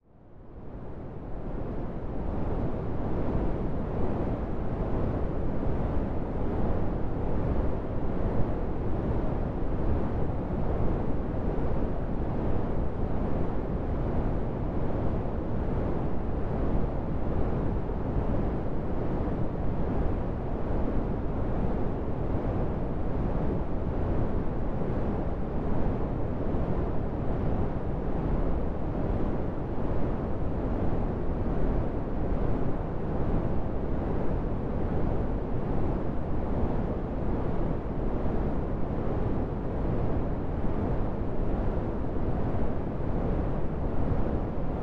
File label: womb sounds; the sleepy owl soundscape pack